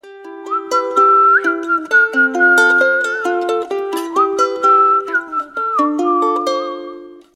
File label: CHILL WHISTLE